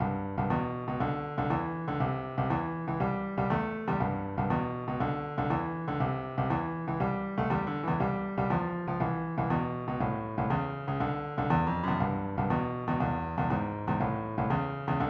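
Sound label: shuffle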